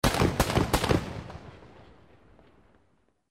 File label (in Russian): zvuk bystroj strelby iz pistoleta